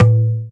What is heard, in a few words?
solid tabla hit